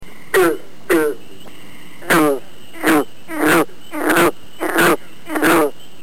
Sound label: rana clamitans, frog toad calls; amphibians